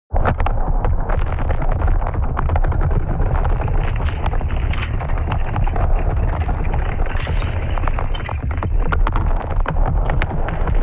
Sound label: collapse